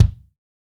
Gretsch Kick; Sonic Foundry (Sony Creative Software); Vital Drums

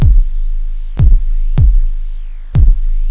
heart beat, breakcore Breaks

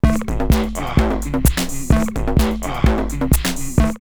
Rave drumloops 128; X-Static Goldmine; 90 s Sample CDs

